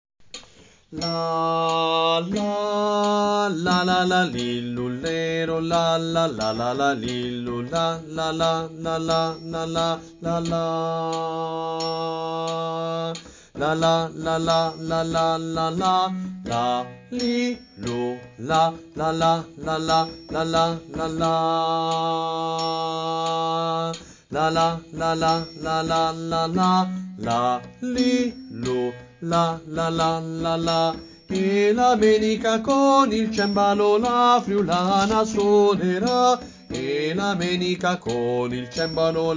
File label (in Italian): Bassi; Coro Isola del Giglio; Il Trescone